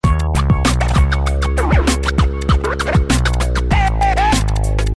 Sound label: Samsung Galaxy Mini 2 Sms